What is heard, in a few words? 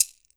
maraca; E-MU Producer Series Vol. 7 – Old World Instruments